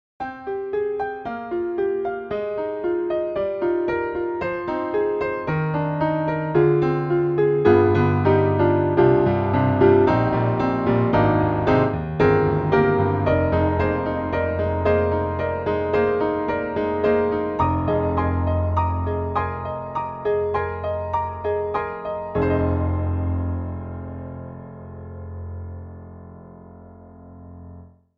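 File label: Vertical Specimen, piano; Cakewalk; Cakewalk Sound Center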